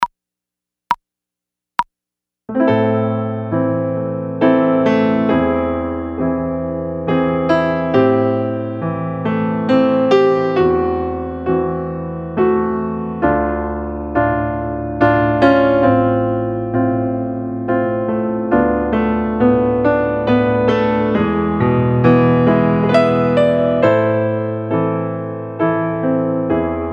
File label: 68bpm Backing Track